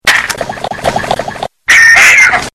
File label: Bubbly Bong Hit